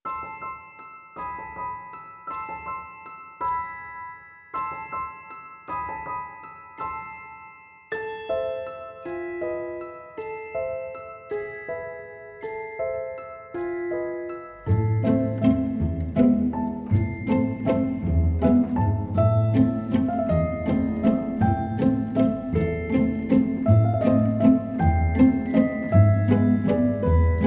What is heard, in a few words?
italian waltz